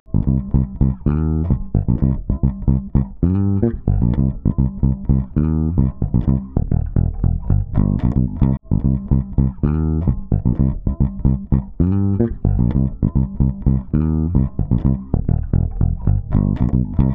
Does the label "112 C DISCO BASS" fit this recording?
Yes